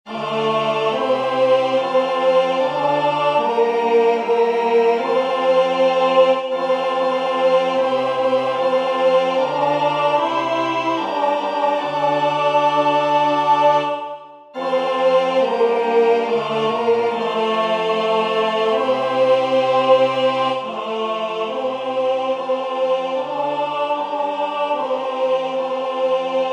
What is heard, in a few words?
Agnus Dei tenor; singing divine service iii in parts